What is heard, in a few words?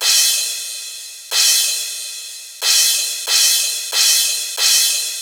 Hip Hop Intro Crash, Cymbals, Drums; Digidesign; Pro Tools Essential Loops